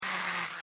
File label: Servo Mechanical SciFi Robot Toy, Scifi Servo E Mechanical Robot Small Motor Toy; BBFX